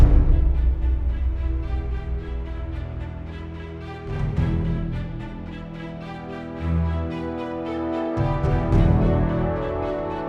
Dungeon Exploration Loop; Fort Triumph

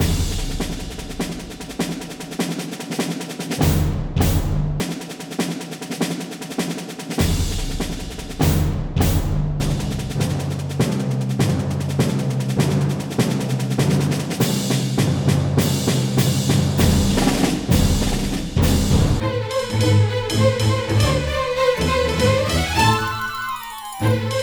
Strings & Perc; Rayman Legends; The Great Lava Pursuit

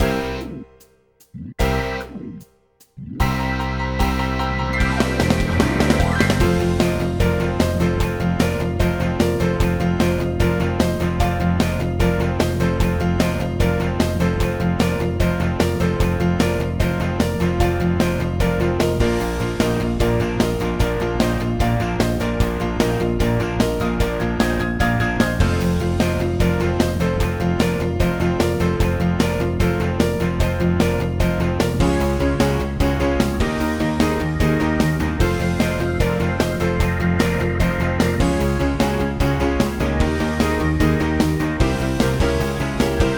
Bonus Level; Stand O'Food 3

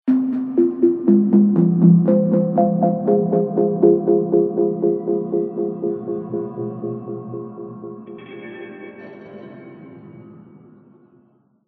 Discover Cave MUSIC

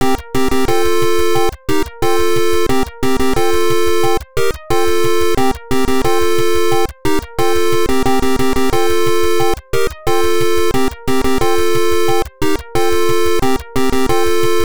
Bonus; columns taisen mode tsuki pc 98 gamerip 1991

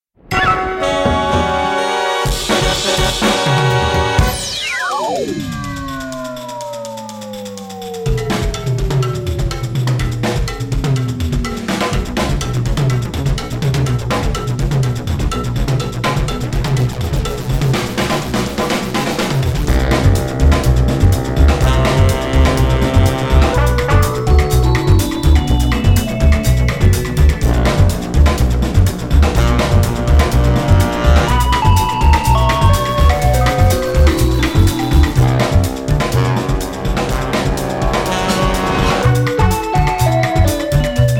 tutti neo jazz high alert

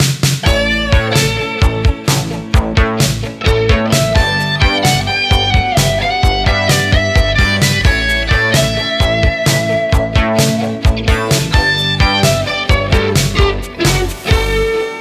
Stinger; Salty Shores

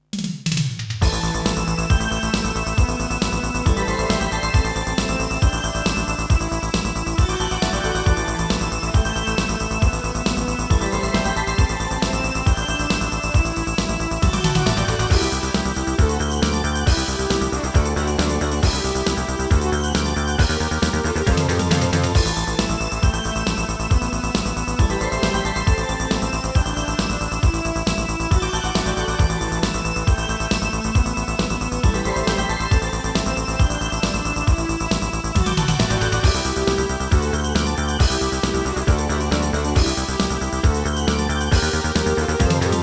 Synthesizer Version; Super Famicom Magazine Vol.10; Riki Choushuu

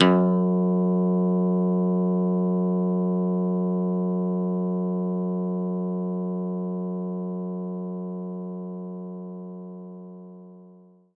Pick Bass E0; knights try

